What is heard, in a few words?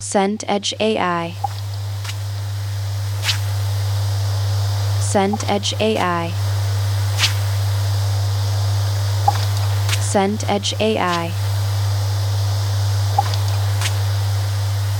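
ambience harbour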